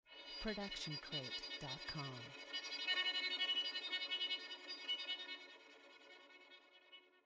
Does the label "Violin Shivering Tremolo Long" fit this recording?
Yes